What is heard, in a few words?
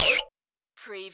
CARTOON MUSICAL ZIP